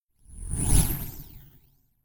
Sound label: Whoosh SciFi; bottlerocket